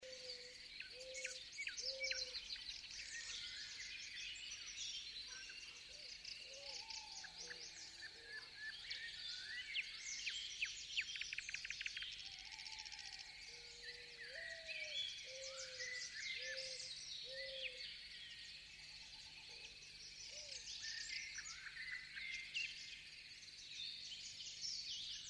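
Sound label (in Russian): птицы в лесу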